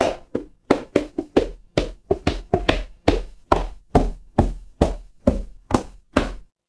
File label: footsteps stairs